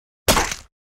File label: fights